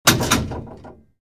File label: Gym leg press machine weight stack